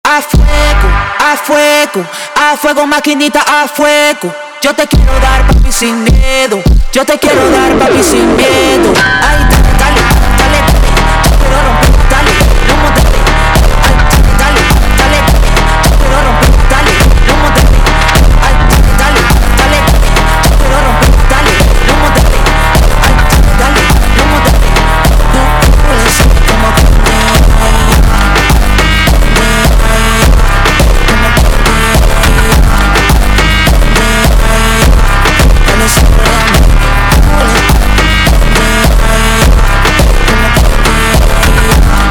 Montagem Hardstyle slowed, funk

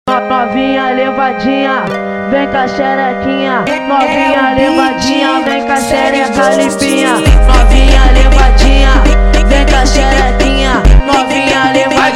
slowed; funk

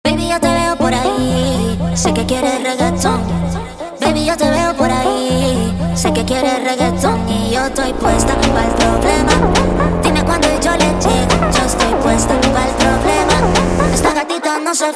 slowed, funk

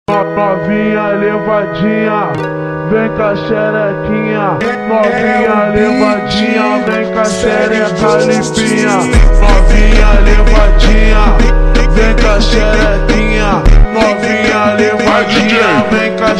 ultra Slowed; funk

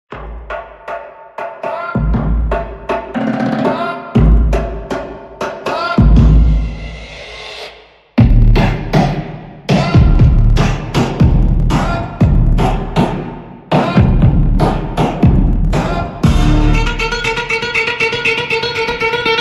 Funk 8d Audio

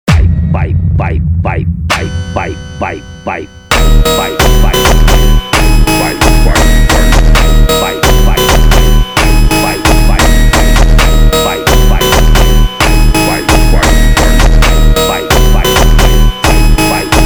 Automotivo, funk

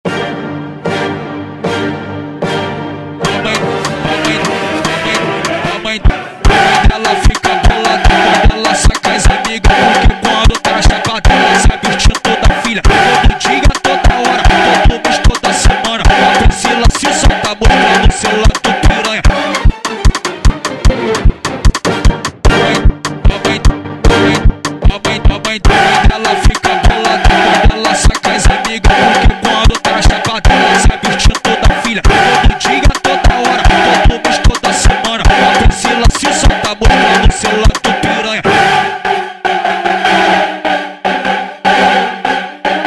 Funk

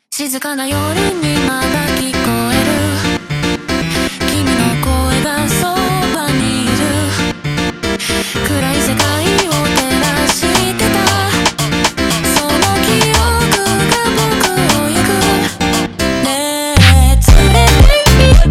slowed, funk

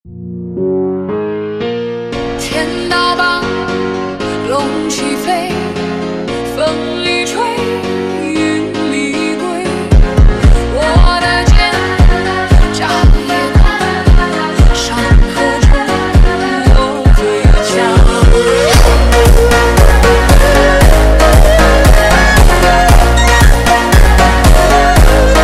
super Slowed, funk

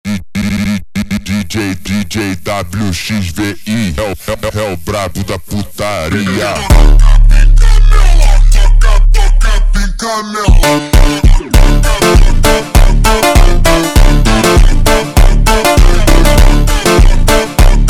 super Slowed, funk

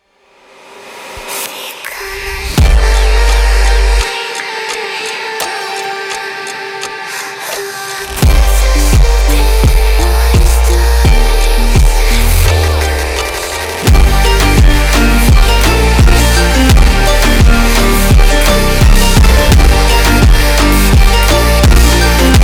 Funk, funk

